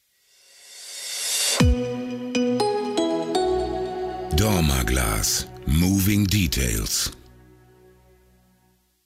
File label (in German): Soundlogo; Dorma Main; corporate sounds produktion